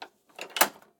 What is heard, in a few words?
hotel door metal deadbolt unlock; TheLibrarybyEmptySea; Gateway